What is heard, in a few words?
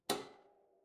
impact anvil